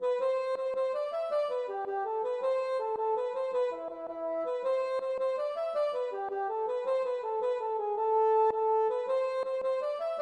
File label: jig